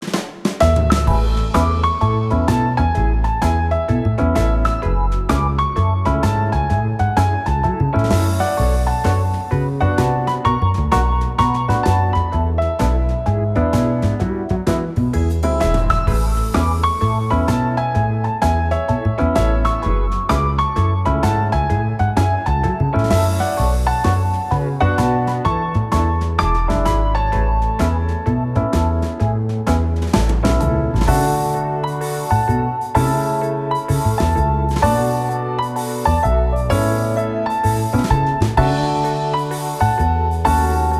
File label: BGM SHOP FLOWER; Girls RPG Cinderellife; 3DS